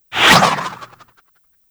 Grapple Throw; Warframe; Warframes; Berserker

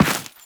Run Dirt, Footsteps; Enemies; Warframe; Teshin

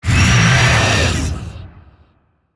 Vocal Pain; Dialog; Warframe; Zariman; Void Angel

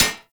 Short Sword Medium Impact Armour; Weapon Impacts; Player; Swords; Combat

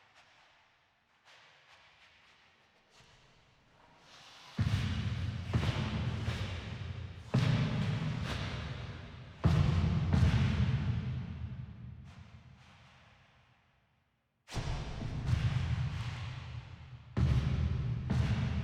Foley D; Cinematics; Finale

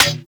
Melee Staff Impact Generic; Warframe; Staffs

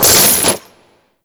Condemn Chains Beam; Warframe; Priest

